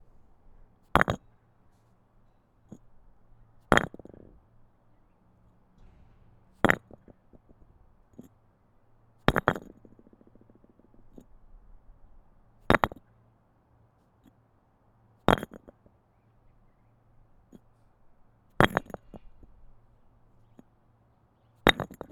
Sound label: Brick Falling On Brick